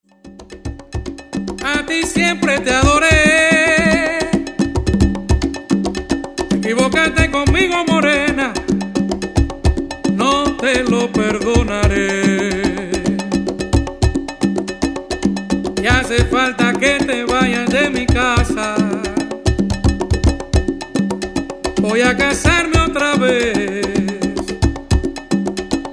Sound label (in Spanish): Rumba